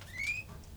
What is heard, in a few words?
contact call